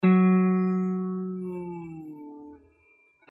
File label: slide guitar; amore&guerra(2006)